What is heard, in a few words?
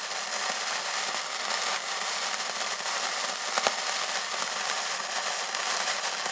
Sparklers